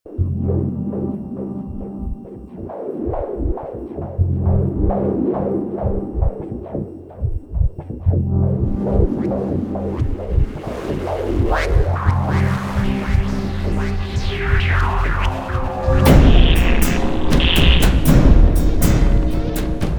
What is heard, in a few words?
Big bang, Effect Percussion, Tam Tam; Dystopia Scapes